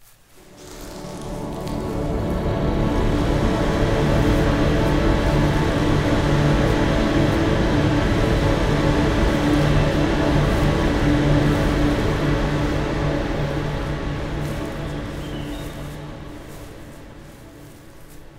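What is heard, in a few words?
pad giant D major, synths pads; base pack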